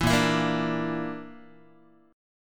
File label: A sharp Major Flat 5th down Guitar; strummed